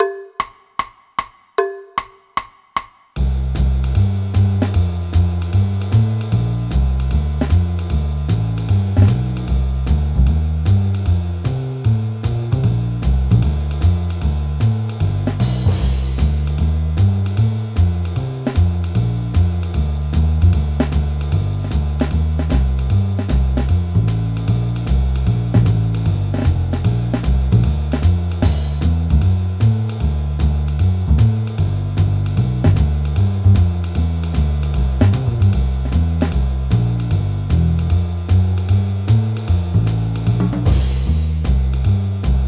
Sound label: Accomp No Piano Guitar